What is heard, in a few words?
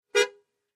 Aston Montego Int Hrn; horns